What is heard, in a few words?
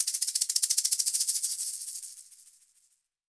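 shaker egg, percussion; ILIO; Synclavier Percussion 2; World & Orchestral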